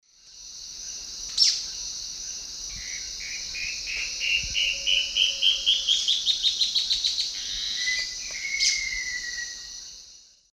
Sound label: Thamnomanes ardesiacus, call and song, S. America Eastern Lowlands